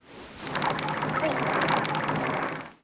squeak, SEA; AMBIENT; Pirates Of The Caribbean

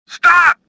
anger; worker2

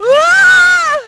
fall; Splashdown; XBOX

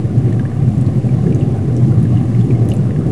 Submarine; Finding Nemo; XBOX

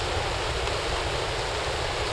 Tree wind; Star Wars Knights Of The Old Republic II; XBOX

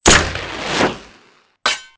grappling hook; Soldier Of Fortune II Double Helix; XBOX

